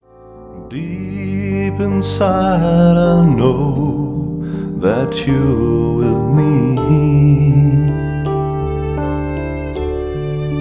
friend from afar orch

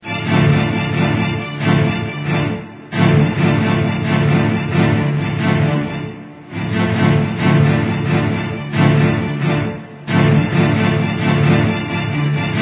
D Minor Dark Strings

